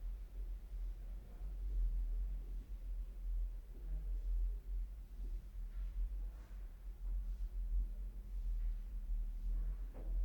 Basement Room Tone

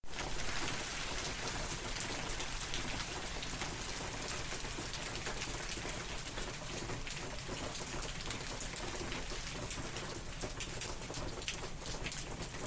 Rainy Front Porch

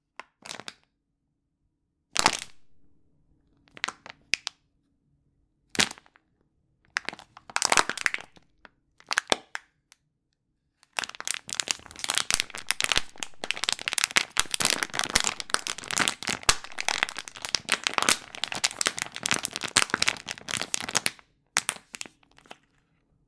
Crunchy snaps

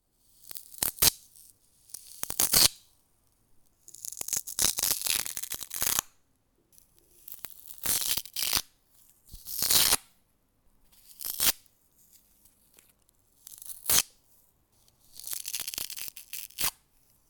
Tearing Foam Paper

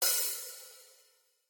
Hi Hat Open

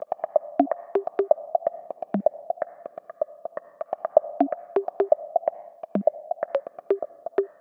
Percussion Groove Blip Conga

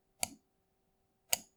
Mouse Button Long Click

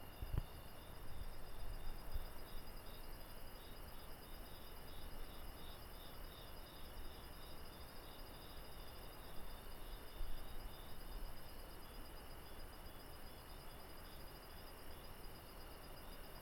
Country Night

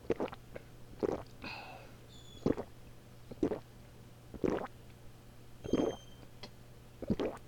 Glug

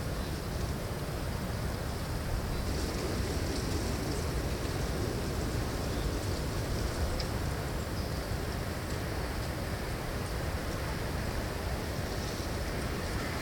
Ambient Spring Trees Leafs Windy

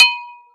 Low Agogo

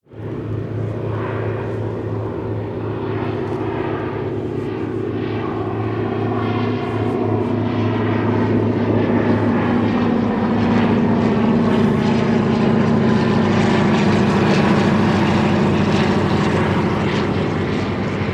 Aircraft Run

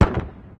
Distant Explosion Of Propelled Grenade

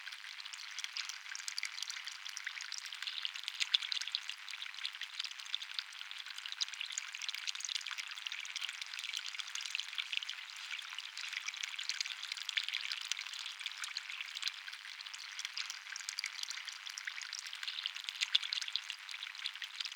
Water

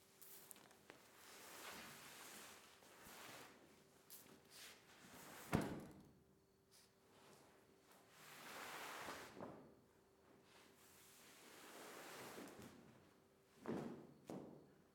Dragging Carpet

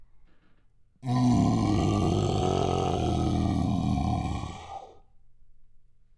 Random Vocal

